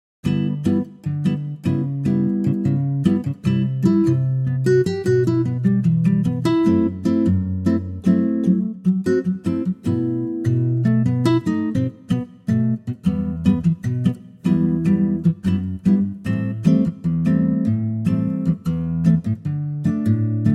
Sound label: Ac Gtr Em